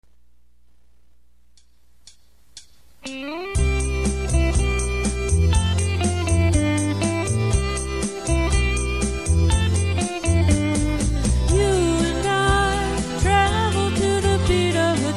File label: Oldies